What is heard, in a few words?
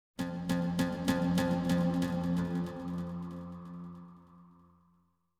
Strings; Cairo